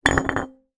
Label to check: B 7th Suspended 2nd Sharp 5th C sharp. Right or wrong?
Wrong